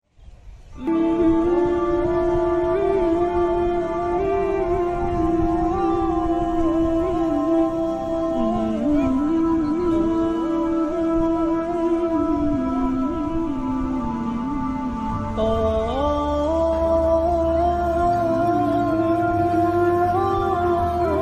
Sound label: ISLAMIC MUSIC BACKGROUND NO RINGTONE